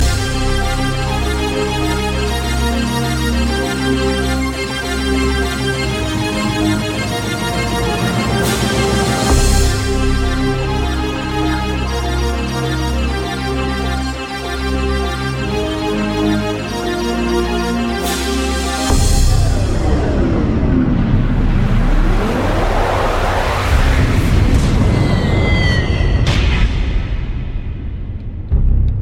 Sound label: Stream Sms